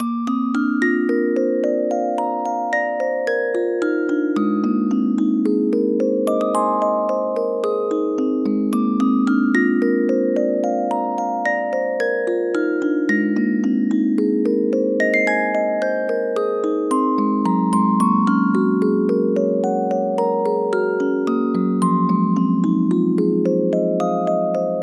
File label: musicbox ocean